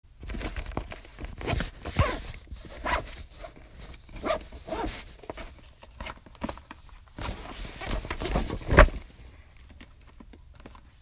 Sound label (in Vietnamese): tieng tui dong mo balo tui xach